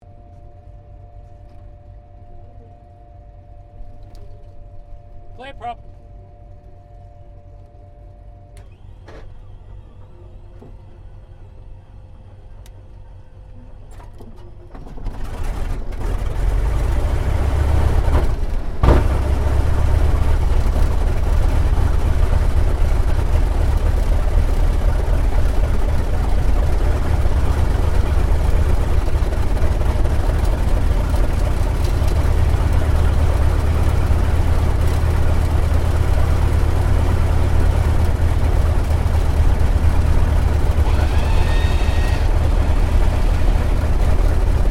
AIRCRAFT T-28D TROJAN STARTUP INTERIOR; Airplanes; Vehicles

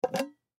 Jar Remove Lid, Jar Remove Lid Glass; Food; Household; foley; Blastwave

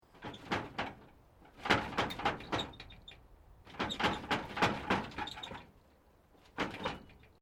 Metal Corrugated Iron Wind Movement

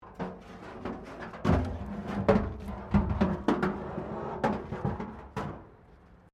METAL BIN SCRAPE PLASTIC CRASH BARRIER

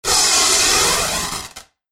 Electric Flare, noise music

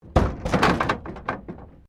Wreck Door Panel Clunk Metal; Wrecked Cars, impacts, crashes, scrapes; Objects

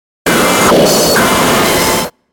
Blip Harsh Static Noise; Beep; Production Element Imaging Element Accent Transition; Blastwave

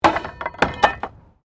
Wood Metal Fall; Material components for constructing more complex sounds; Materials

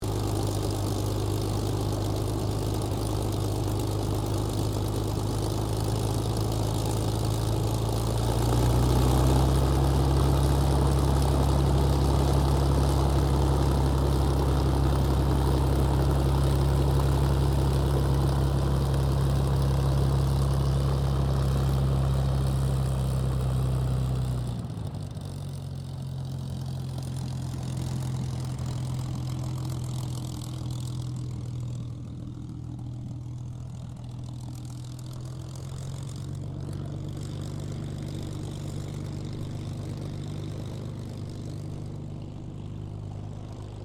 AIRCRAFT P-40F KITTYHAWK TAXI; 1942; P-40F Kittyhawk / Warhawk/Tomahawk; Airplanes (Sound Effects:Vehicles)

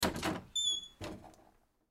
Closet Door Solid, Closet Door Solid Wood Open; Household; Blastwave

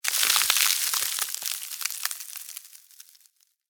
Ice Crack Freeze, Instant, Scifi; Scifi, Ice, Crack, Instant, Freeze, Science, Fiction; Sci Fi Sound Effects

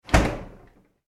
household fridge freezer door shut; Kitchen (Sound Effects:Household); McKinney Sound

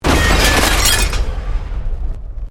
Explosion Medium Metal Glass; house, building or vehicle effects; Bombs; Created explosion effects; SoundEffects Foundation2

